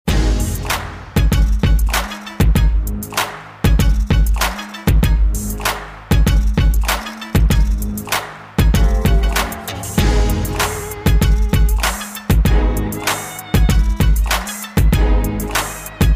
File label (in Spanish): Hip Hop; honduras musical 9no grado